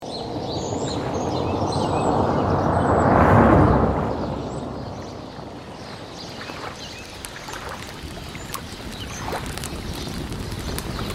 campfire by the lake ambience with crickets owls water and night sounds; for relaxation